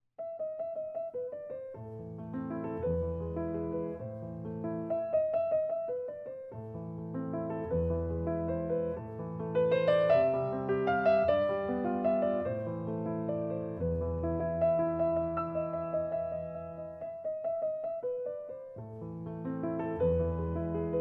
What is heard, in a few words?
Piano; Fuer Elise a Moll